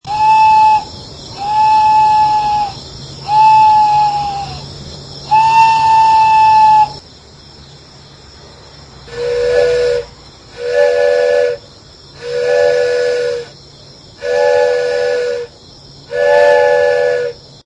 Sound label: whistle wooden; trains